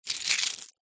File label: shells small handling; foley